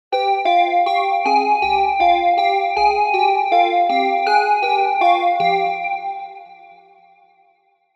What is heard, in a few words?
PS-3300 Glass Bells; Cherry Audio